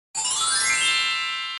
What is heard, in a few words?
magic wand